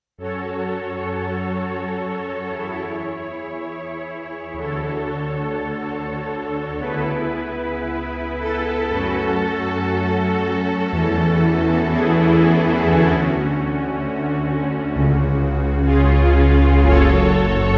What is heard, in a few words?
pad